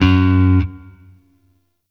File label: Sentient Shawzin Oct Two F Sharp; Warframe